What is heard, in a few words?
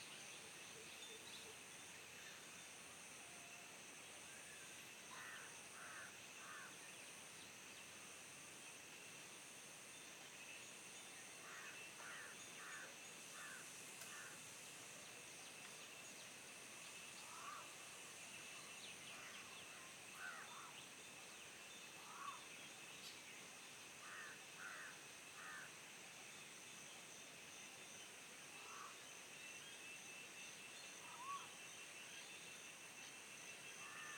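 Ambience Base Evening; Sx Amb 2-Botanist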